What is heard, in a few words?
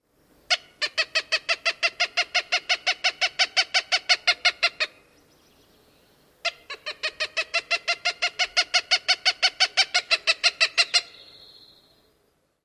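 Cooper's Hawk cak-series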